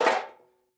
drop metal sheet; Rust

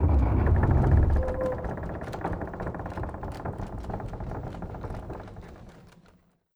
door large wood open start; Rust

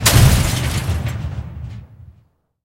projector crash; tanks; War Thunder

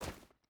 footstep hide stones run; Rust

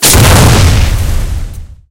tank hit aphe small; tanks; War Thunder

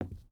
footstep boots wood walk; Rust

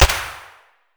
glock17 indoor distant silenced; Escape from Tarkov

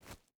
footstep hide gravel jump start; Rust

